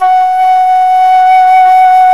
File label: Flute; CMI